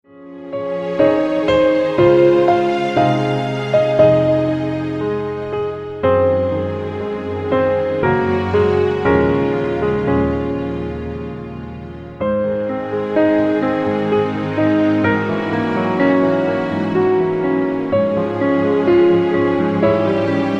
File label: Abide With Me Tis Eventide